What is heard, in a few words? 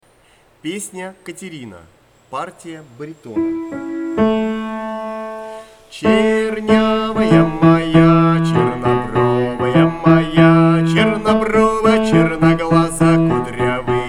baritone